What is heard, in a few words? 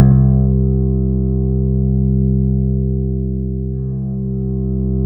C2, SynthLine; 90 s Sample CDs; NiceBeats; SynthLine VOL-33